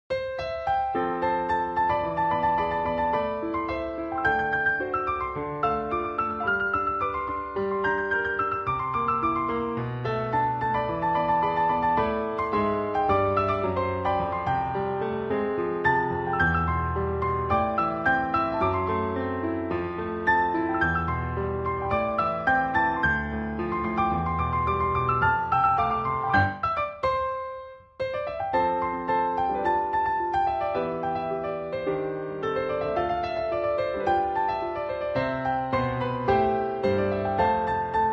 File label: piano